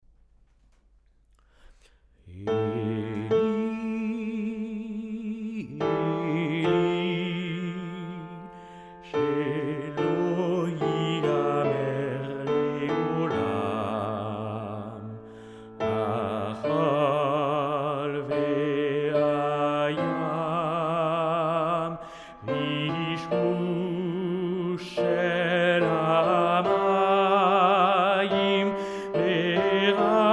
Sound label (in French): hommes; eli eli